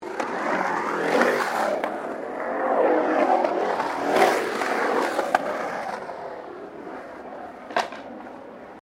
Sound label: Skateboard Skate Bowl Round Bowl; Skateboard sounds; Sports; Skateboarding